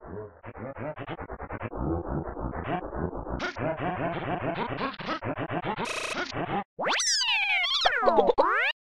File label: Erase Lines; Mario Paint Prototype